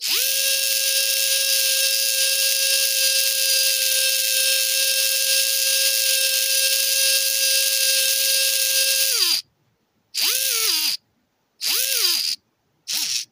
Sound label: Servo Motor Working